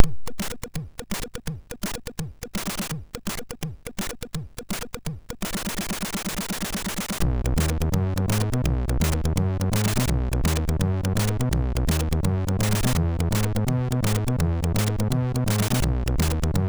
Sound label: Rock n Roll, MOS6581R2